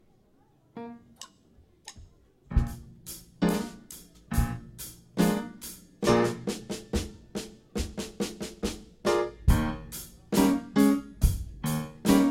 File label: Rock